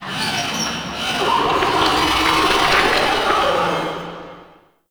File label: Ship Metal Torture; Warframe; Corpus Ship Remaster